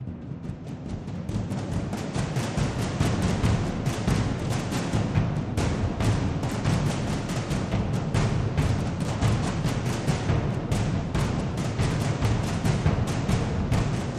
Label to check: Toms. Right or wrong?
Right